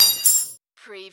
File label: Button Sound - Metal Peg Drop